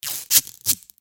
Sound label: Rotor